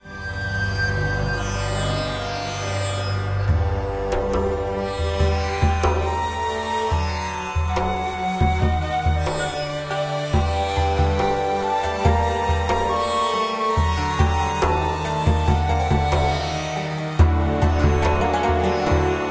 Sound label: Turk Rhythm, music; Turkey